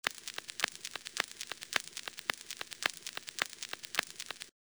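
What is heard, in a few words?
scratch; device sounds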